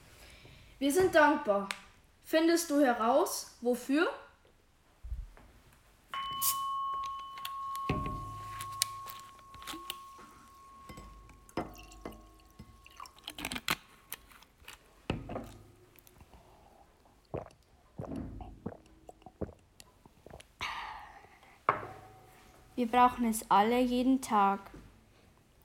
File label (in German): Akkordeon; Hörrätsel Erntedank